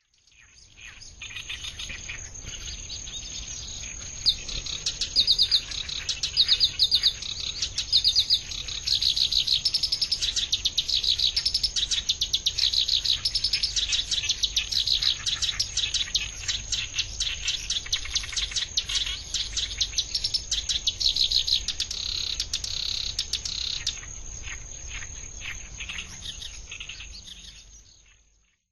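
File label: Black-browed Reed Warbler; koe; watarase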